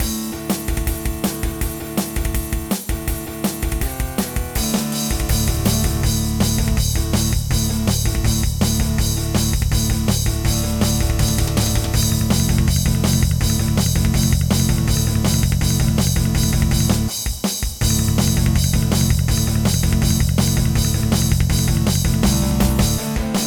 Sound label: XR385; Evilution